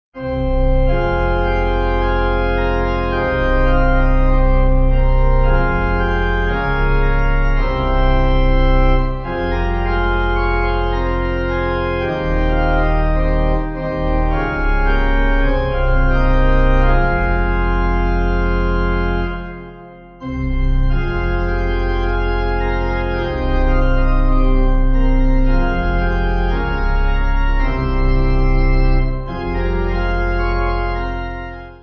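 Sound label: Pipe organ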